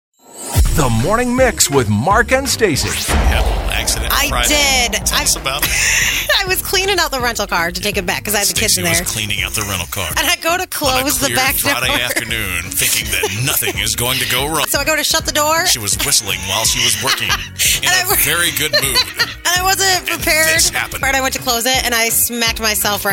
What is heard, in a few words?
car door